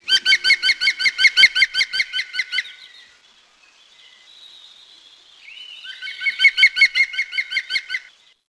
Green Woodpecker; wood peckers; DKMM Nature

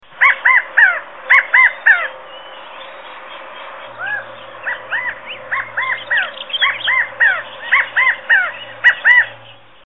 california, upland birds

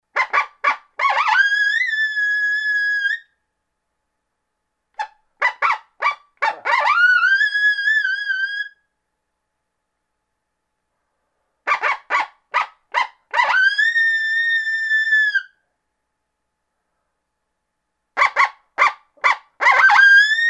Coyote Greeting Howl

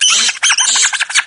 rat die, mice rats

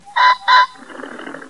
common pheasant sweden; upland birds

